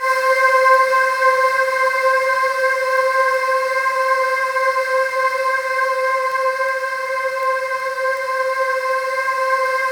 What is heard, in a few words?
C5, Synth Leads